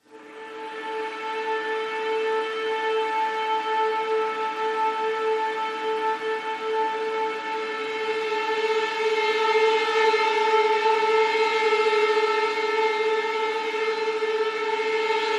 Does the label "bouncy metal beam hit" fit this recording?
No